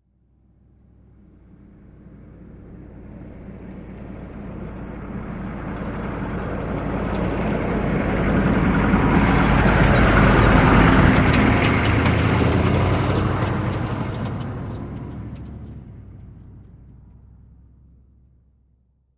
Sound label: Humvee Slow By Rattle